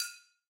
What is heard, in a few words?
slider Increase Click; lost relics